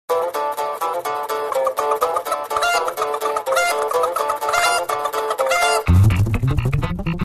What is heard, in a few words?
Msg Alert